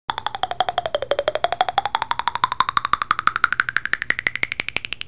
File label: Clack rol, jew's harp